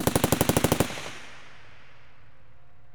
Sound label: machine gun; Crash Bang Boom